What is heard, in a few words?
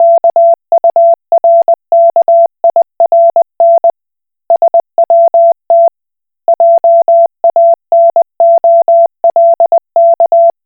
Koch Morse